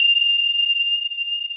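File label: grand piano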